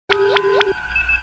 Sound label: com beep, computer